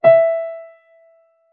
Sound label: E5 piano note